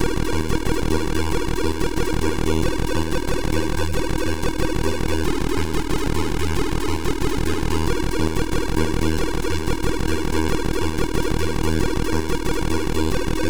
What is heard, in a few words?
Trance